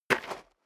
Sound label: step sneaker gravel light single left; schritte